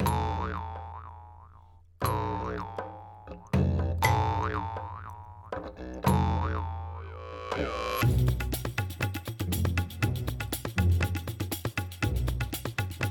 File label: Suspense; Jibberish Jungle; Rayman Origins